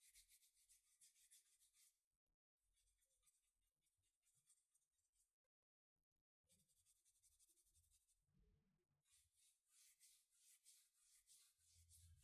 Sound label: Rubbing